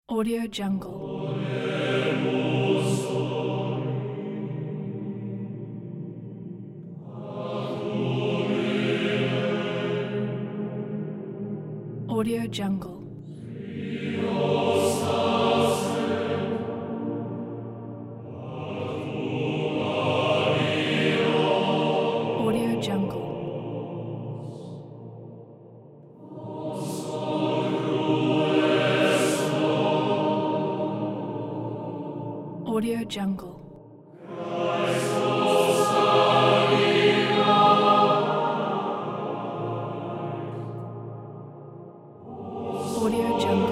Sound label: emotional choir